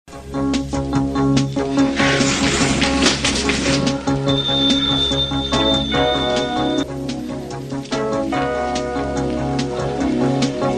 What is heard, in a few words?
dramatic theme